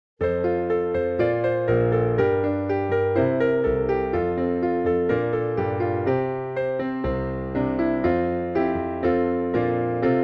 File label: box vocal; angels we have heard on high